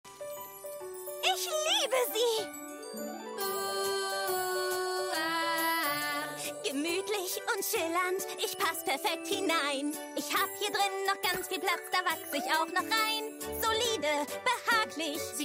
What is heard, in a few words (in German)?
Gesang Kinderserie